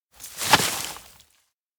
fall; jet; anemoi

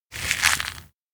sand grit bite; anemoi

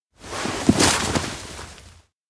dismount horse; spica; anemoi

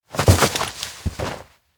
clothes strip off; anemoi

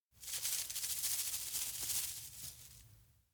bug climb wall; anemoi

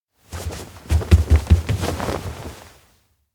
hide behind; rikka; anemoi パッケージ版 1.1

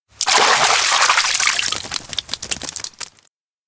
fish land; anemoi

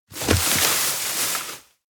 grass rustle gasagasa; anemoi

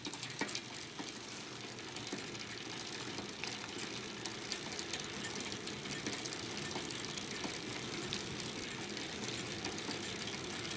Bicycle ride; anemoi

